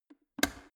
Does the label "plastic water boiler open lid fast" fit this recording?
Yes